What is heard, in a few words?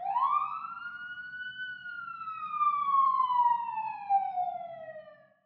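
ambience Police Siren; netent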